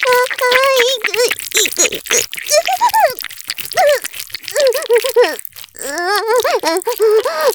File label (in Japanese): 絶頂 潮吹き; 騎乗位